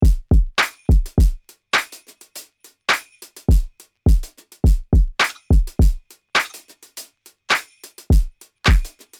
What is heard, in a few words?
Drums; Zen Master Demo